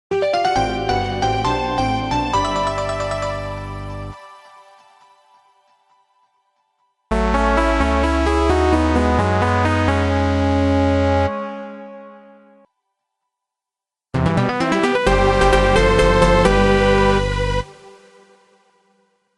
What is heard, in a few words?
Fanfare Collection